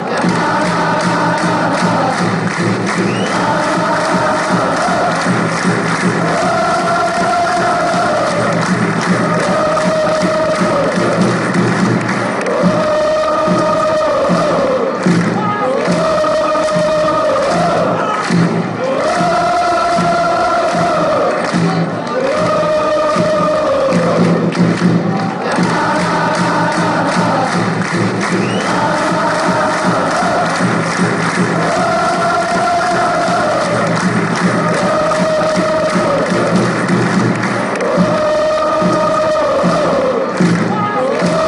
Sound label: dale, chants; cavese; scfc